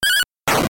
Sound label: Attack Hit